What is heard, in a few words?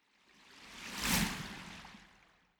whoosh bright